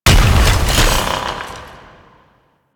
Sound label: Fusion Pillar Impact; Warframe; Qorvex